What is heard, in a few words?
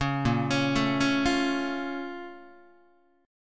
A sharp Minor Major 7th, Guitar; arpeggiated; 4,6,4,7,5,4; 4A,4E,5A,5D,5G#,6C#; JGuitar